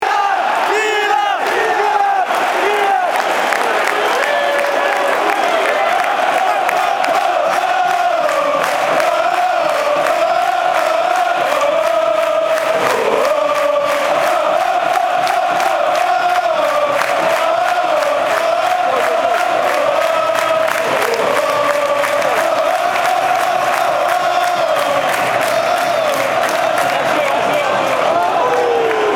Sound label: Ohohohohoh, chants